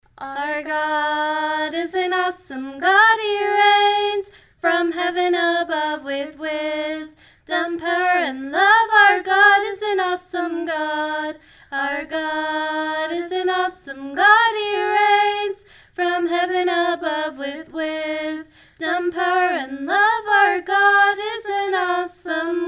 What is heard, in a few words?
(Chorus Only); school songs